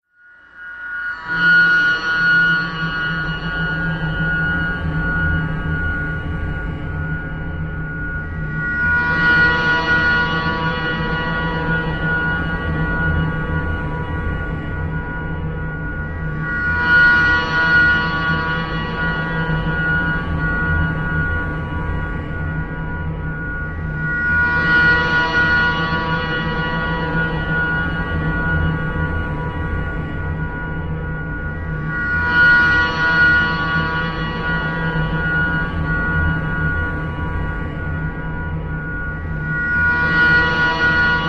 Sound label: Shrill Sweeps W Low A, metallic